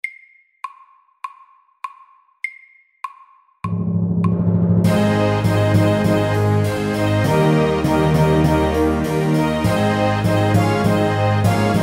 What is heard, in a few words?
Prelude MEDIUM 100